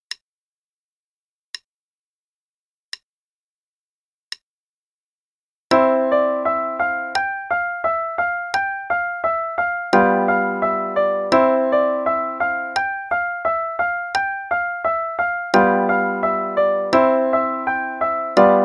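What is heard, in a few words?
Play-a-long, eighth note=88; Czerny 50 Short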